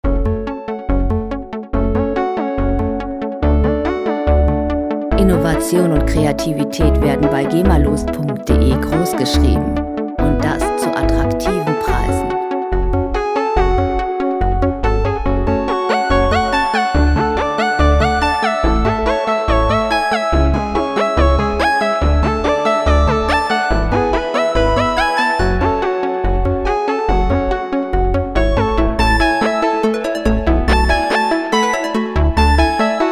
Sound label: arpeggion